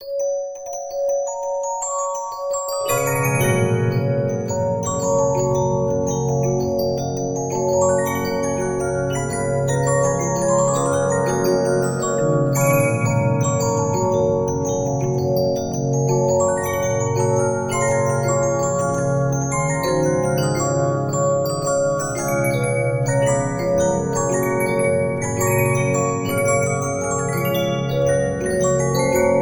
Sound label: Regina, 15inch disc musicbox